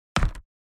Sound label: landed on the ground